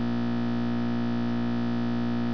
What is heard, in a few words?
neon fan